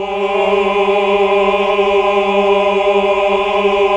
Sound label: HAL AAH. AAAH Uni